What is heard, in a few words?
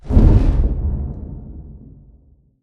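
Explosion Rumble Interior Distant Punch; Boomtown; Titanfall 2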